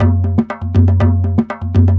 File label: 120 Dhol Ph, Loops & Phrases; Roland BK-5